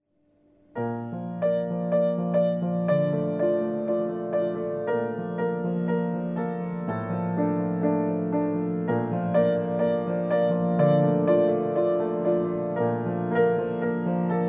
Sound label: Piano